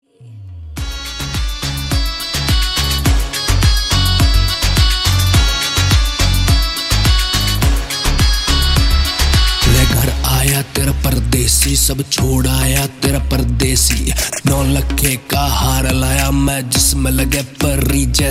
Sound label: Rap Tone